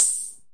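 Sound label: hi-hat open, Roland CR-78 drum machine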